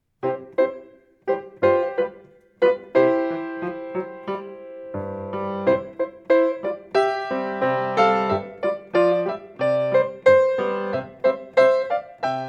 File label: march 90bpm; Czerny